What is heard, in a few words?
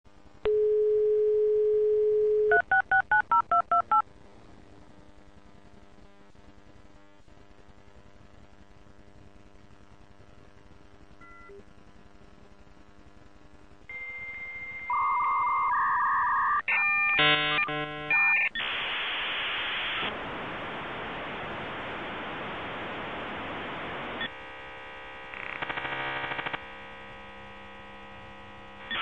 Modem Sound